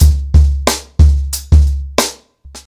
91 bpm drum beat C# key, Free drum loop - kick tuned to the C# note